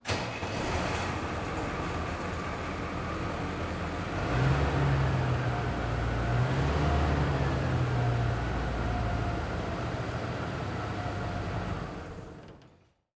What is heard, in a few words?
Emergency Fire Truck Start Up Idle Shut Off Interior Fire House Station Garage Doors Open; emergency effects; AdobeCC